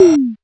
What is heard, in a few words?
Rhythm Tom + Grinder, Realistic Concertmate-670; Layered Kit